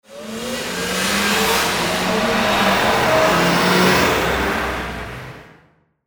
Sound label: race cars zoom by